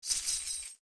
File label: Rustle